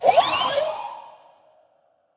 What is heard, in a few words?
BABYMOLOCH damage